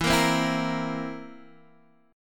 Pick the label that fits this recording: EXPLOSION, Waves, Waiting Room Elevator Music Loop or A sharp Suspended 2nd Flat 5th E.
A sharp Suspended 2nd Flat 5th E